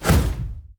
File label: basic Attack; tower necromancer